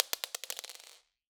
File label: Drop On Floor, Medium Wine Glass